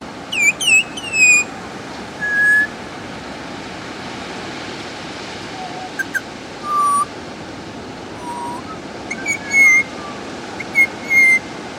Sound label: magpie australia